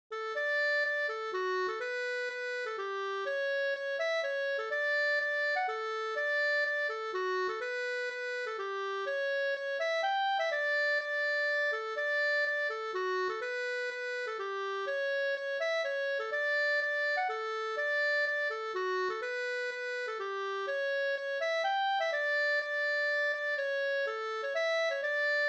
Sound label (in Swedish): polska; fr Borgbacken av Borje Holmberg Mp